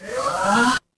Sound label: ui power on